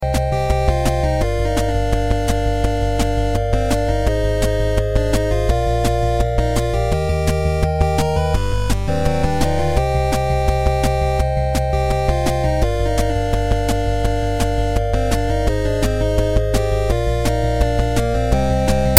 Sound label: Fantasy Sms